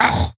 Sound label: wound, creature; Shadron